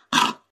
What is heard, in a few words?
cub grunt; minecraft; technic